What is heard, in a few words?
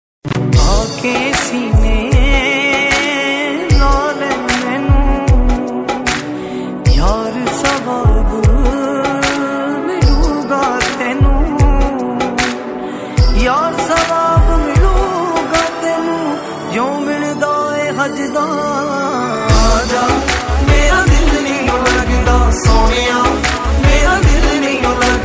Ringtone